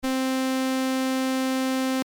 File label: Main Lead 2 vca